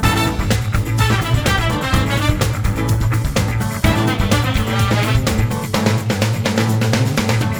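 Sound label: Music Classic Loop; Pictionary; NDS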